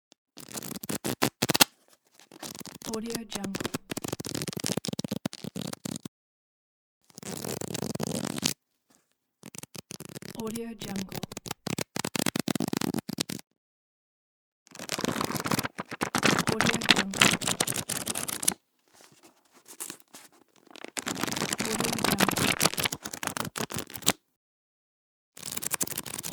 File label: fast flipping page; pure-noise